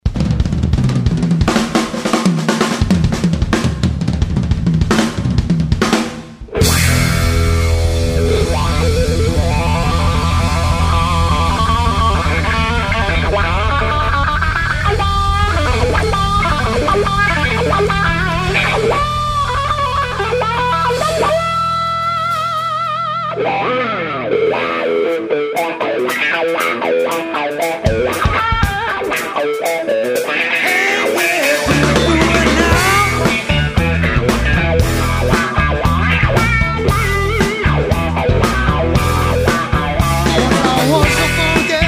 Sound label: funky music